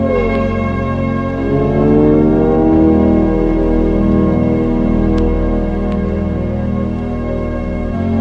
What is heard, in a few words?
loopy soft two strings; ORCHESTRAL and CLASSICAL